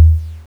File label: neow, zaps and beeps